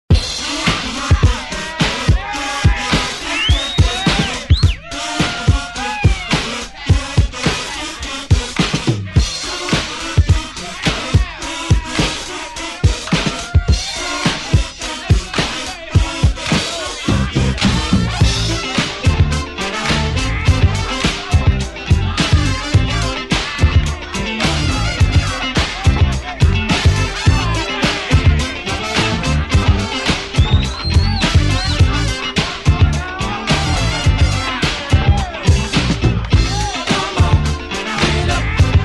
70s 106Bpm; DJDOLLS

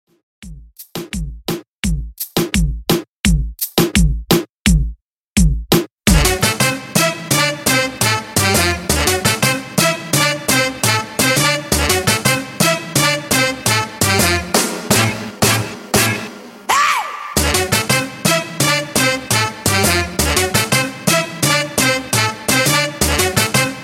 Reggae Reggaeton, 85Bpm

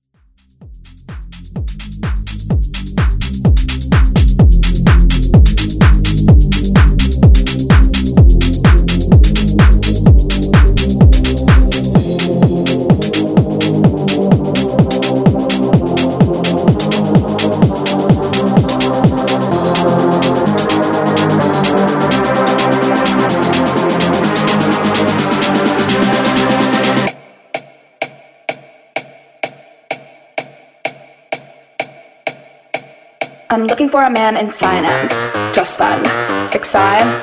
House Mash Up

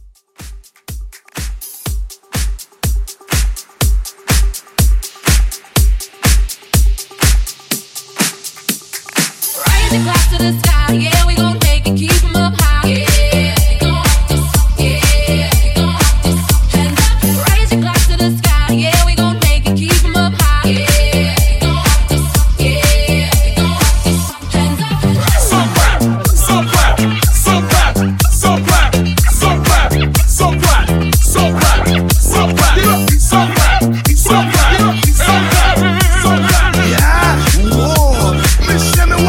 Hype Rework

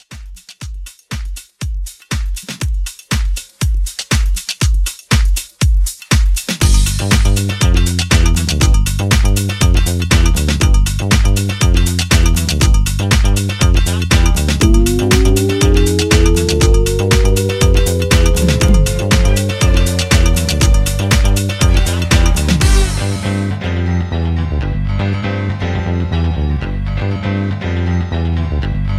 Club House, 120

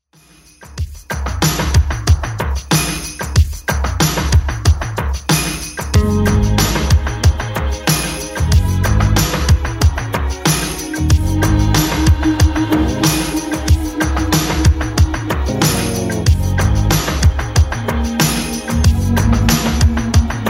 80s Extend Redrum 93bpm

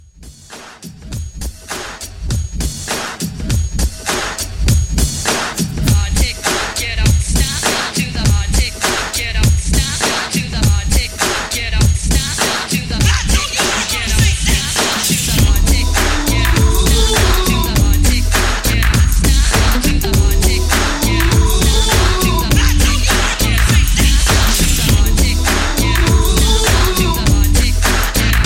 90s R&B Re-Drum 101

